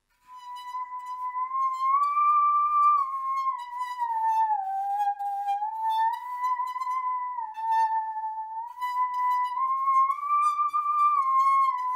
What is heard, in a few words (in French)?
musique, instruments insolites